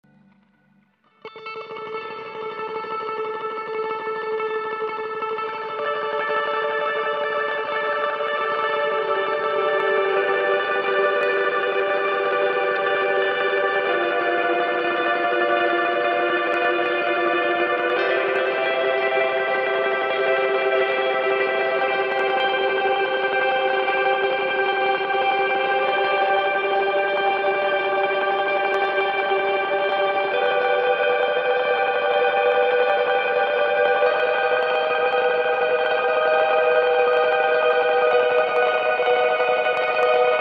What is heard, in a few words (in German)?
flug